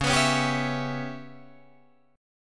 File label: C sharp Minor 7th Flat 5th C sharp Guitar Drop D